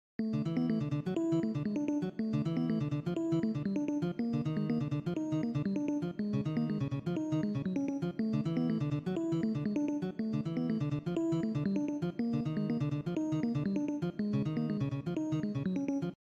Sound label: cont rhythm example